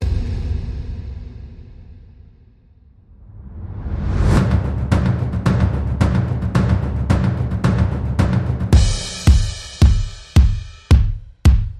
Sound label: Drums Reduced Synths and Bass Only